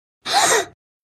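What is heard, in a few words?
Group 5 Year Olds Gasp, cheer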